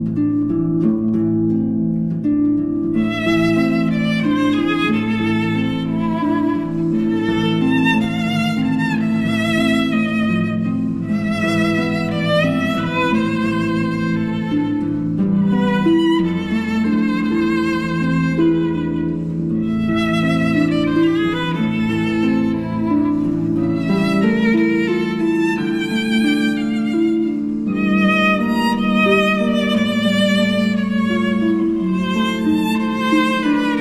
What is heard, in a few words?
Berceuse, for violin and harp